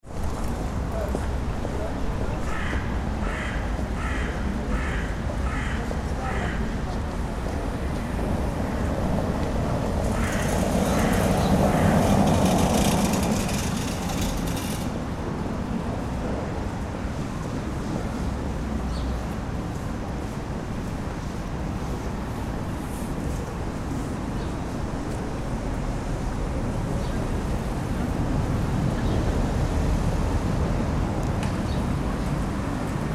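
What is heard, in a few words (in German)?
belebte Fußgängerzone; salamisound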